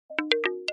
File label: Pebbles